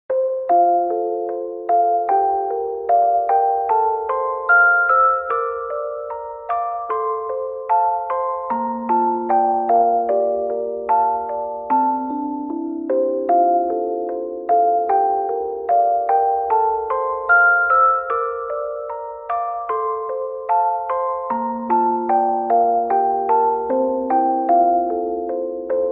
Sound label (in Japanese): オルゴール